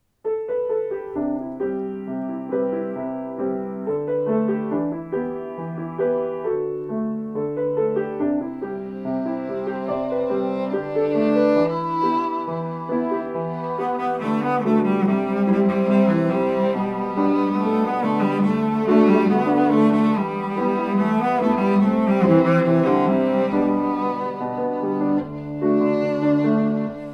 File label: with piano